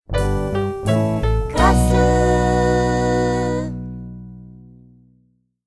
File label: jingle